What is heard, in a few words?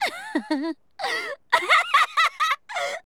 laugh; ssfiv juri